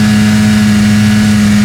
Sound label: rpm, sled; WhiteOut